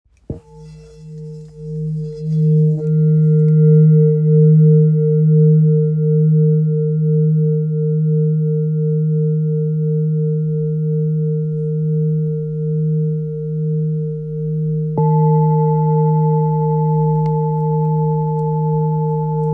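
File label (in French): Bol grave tara 1.215kg ré dièse, Bol chantant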